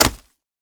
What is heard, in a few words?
destroy; zig zag line